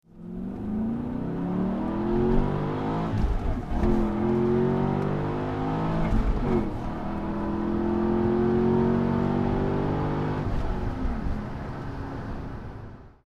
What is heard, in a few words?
magna in car